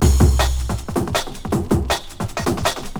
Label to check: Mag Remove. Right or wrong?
Wrong